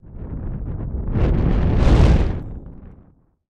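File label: meteorite asteroid sounds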